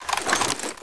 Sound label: 556 ar draw, weapons